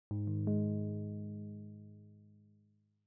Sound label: screen sharing; mail; odoo